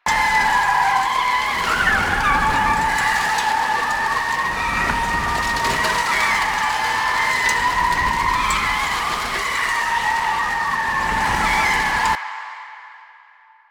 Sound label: Power Slide Loop, Motorcycle; Motorcycle Ninety Nine; Bullet Jump